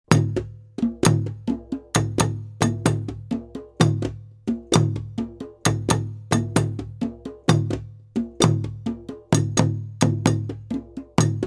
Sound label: Aconcon ensemble